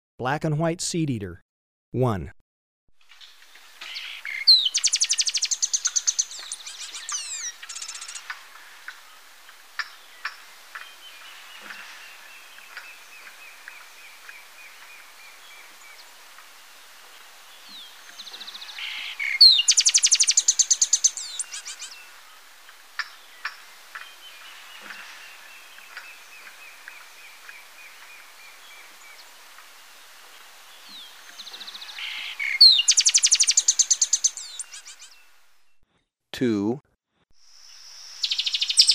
Black-And-White Seedeater, Birds of Ecuador; S. America

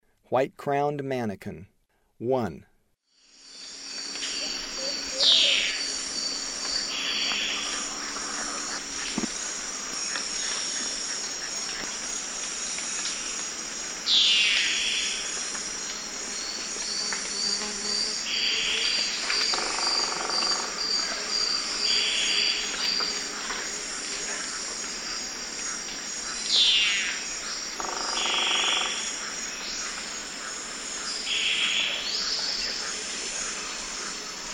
White-crowned Manakin; S. America; Eastern Lowlands

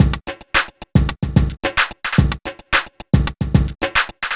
r n b kit 110h

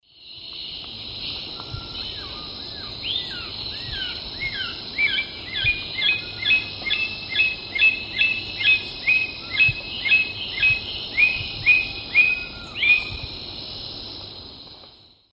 Monasa flavirostris, song; S. America; Eastern Lowlands

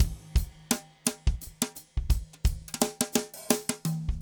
funk rods 170; odd loops

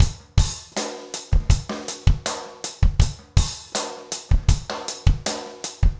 trip hop rims

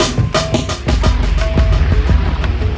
AMBIENT GROOVES; Sound Effect Library

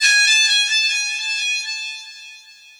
NEW ROOTS REGGAE

